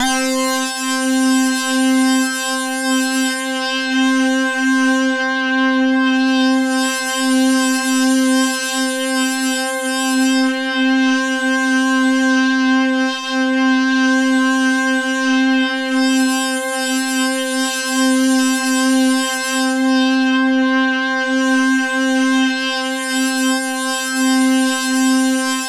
T8-SYNC, JV-1080

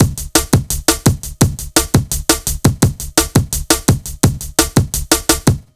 170 break, Explosive Jungle

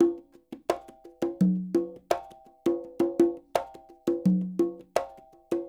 FUNK 84, LOOPS, Percussion; SF LOOPS 84; NYC Percussionworks; Sampleheads

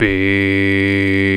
VOX, Male Scats

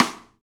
Rock Stick, Rimshots; Roland BK-5

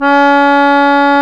BASSO C#5; Roland - LA Composer vol. 1

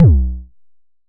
909 B1, Kicks; Drums; MBase 11 - 909

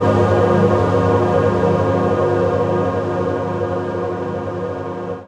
Pad; neuro; InsideInfo

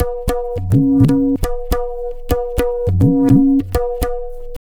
tabla, 105 bpm; Zero-G; Pure Tabla

